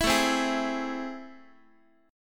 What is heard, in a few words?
A Minor Major 7th, down, Guitar; strummed; AmM7; JGuitar